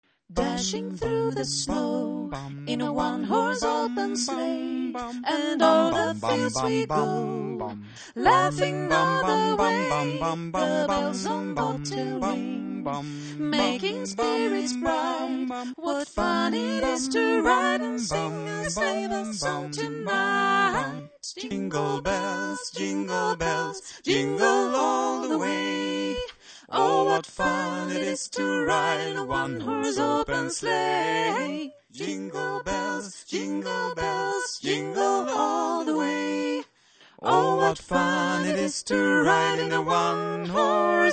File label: Jingle alt